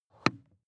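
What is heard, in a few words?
sports car interior pedal clutch step hard; verkehr transport; autos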